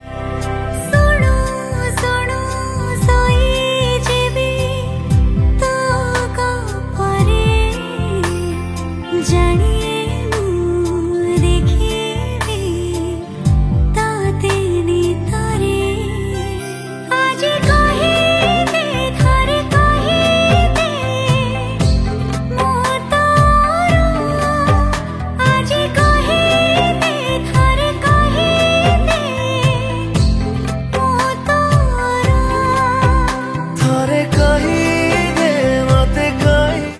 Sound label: New Odia Song